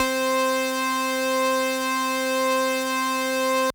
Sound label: Synth C, Synth Prophet One Shots; Electronisounds